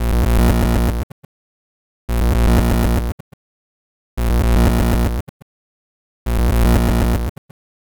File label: Hott Fuzz, Synths; Sonic Foundry (Sony Creative Software); Modular Electronica